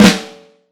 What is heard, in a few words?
FLAM, Vintage Snare Drum; Q Up Arts; Johnny.C's Vintage Snare Drum; 7X14